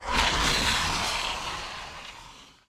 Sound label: lava hiss stereo; Scrat